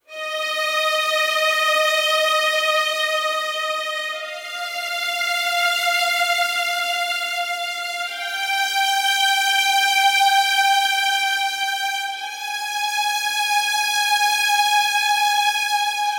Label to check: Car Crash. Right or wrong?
Wrong